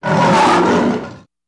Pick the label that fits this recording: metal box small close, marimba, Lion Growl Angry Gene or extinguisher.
Lion Growl Angry Gene